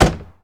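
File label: Truck Cherokee Door Close; SFX Kit; Trucks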